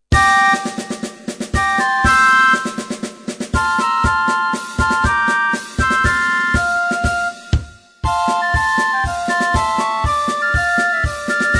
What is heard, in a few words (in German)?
marsch